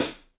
HHS32 CHH; mpc3000

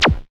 elec perc; Mirage

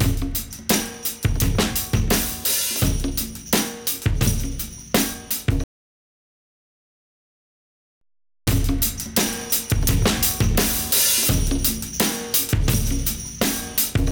TRANSFORMER DRUMS DRIVE; SATV Saturator

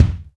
BD

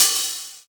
ER1 OHH; RHYTHM WORKS

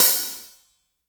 R70 HH Real OH

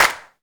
DSM1 clap

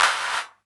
CLAPS GATE

